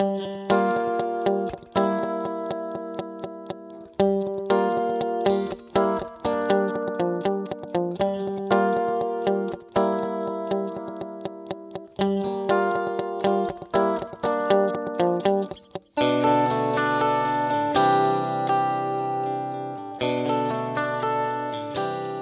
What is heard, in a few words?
Guitar Yamaha D5000 Mod Delay; Vintage Digital